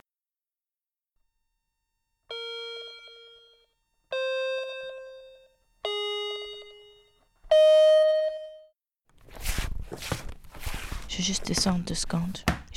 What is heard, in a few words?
MusicBox Sound Drop